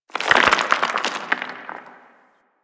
Rock Slide Medium; Ambience; One Offs; Warframe; Eidolon Plains

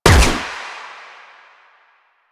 Fire, Weapons; Latron Prime

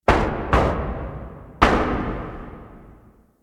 Ghost Cargo Knocks; Ambience; Props; Warframe; The New War; Zariman; Quests

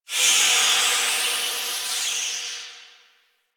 Town Steam Release; Ambience; Warframe; Duviri

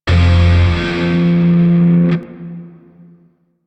Shawzin Pent Min Chord F; Grineer; DE; Warframe

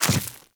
Inf Small Land Dirt, Infested, Footsteps; Enemies; Warframe

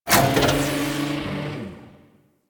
Servo Flutter, Ambience; Warframe; Corpus Railjack

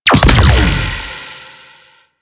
Tripwire Ability Activate; Warframe; Venus; Venus Commander Aqua; Abilities

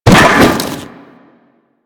Fall Metal; Foley; Warframe; Tenno

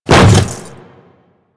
Hammer Boss Step Default, Footsteps

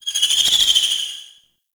Rocket Launcher Proj Whizz By; Enemies; Warframe; Kela De Thaym

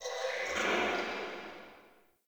Under City Cavernous Water Lap Rock Distant

